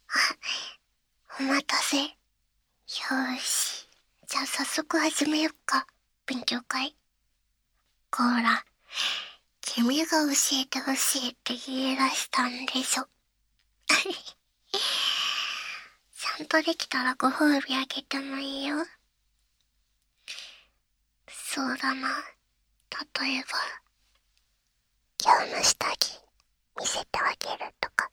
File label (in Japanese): 耳舐め; 放課後; 勉強会